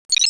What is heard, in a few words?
beep; Babylon 5 - Entertainment Utility